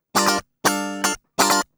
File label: GUI 120 5aG; Best Service Gigapack 2